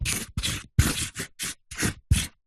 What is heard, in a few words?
marker pen highlighter luminous draw diagram on cardboard